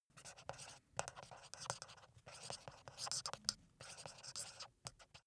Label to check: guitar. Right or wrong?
Wrong